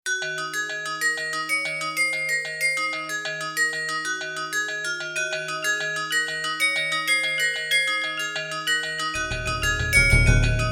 Drums n Bells; PC3

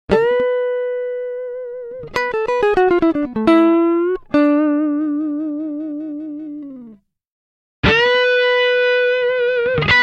Elec Gtr blues lick

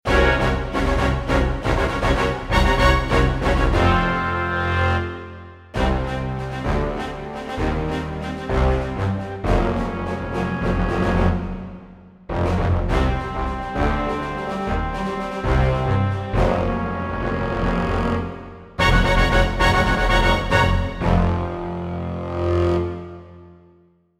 Imperial Orch, Orchestral; MP10

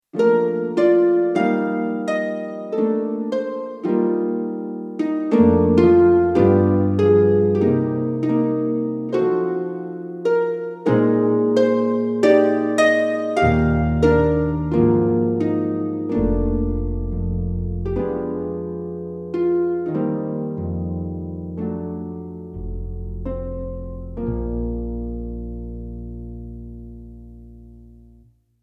Orch Harp; PC3

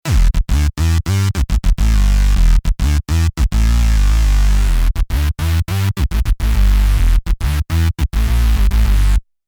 Syn Bass; PC3

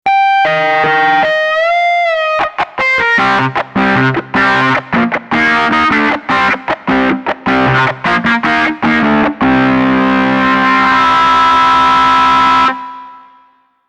Nasty 70s Guitar; PC3

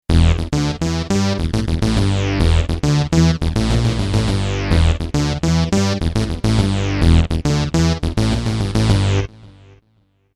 Big Old Jupiter, Synths; PC3

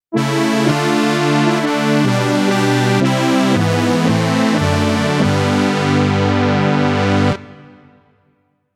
70s Arena Synth; PC3

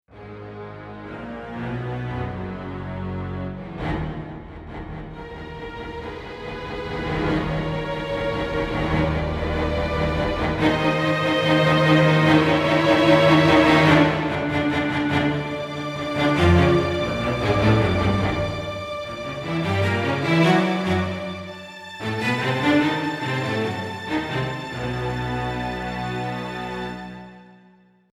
Agrs lo Trem hi, Orchestral; PC3

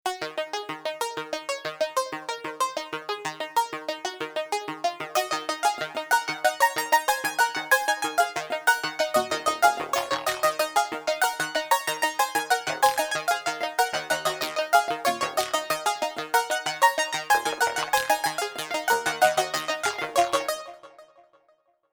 Electro Perc Synth; PC3

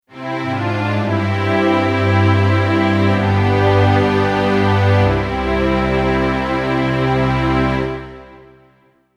LA Strings, Orchestral; MP10

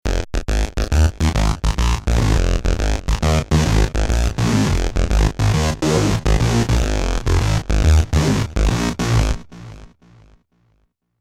Evil Octave Wheel, Synths; PC3

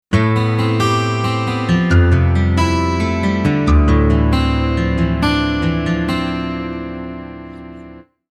Boutique Six Str, Guitars; PC3

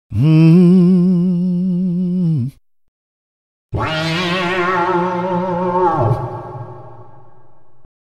hum

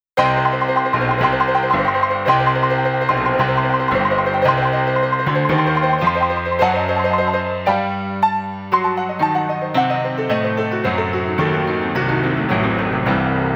Upright Piano; Pianos; MP10

